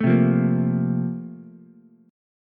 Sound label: Bb Major 7th Suspended 2nd, Ukulele; BbM7sus2 strummed; JGuitar